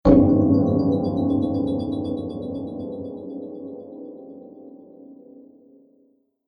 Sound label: Fantasy Magical Hit; Magic Sound Effects